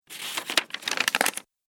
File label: Paper Movement; Paper Sound Effects; office sound effects